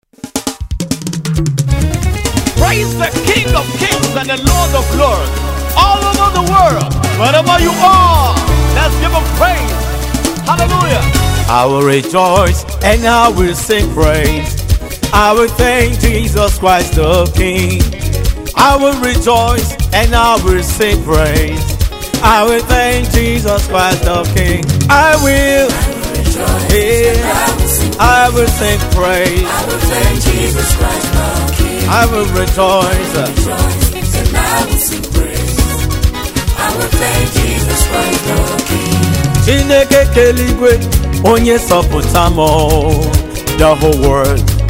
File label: Praise Cantata